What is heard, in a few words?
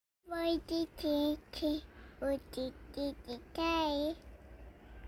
singing